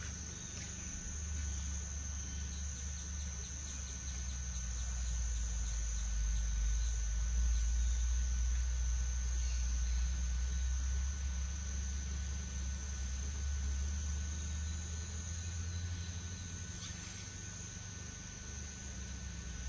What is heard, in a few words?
woodpeckers